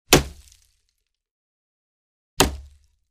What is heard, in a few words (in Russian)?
porazhenie celi iz luka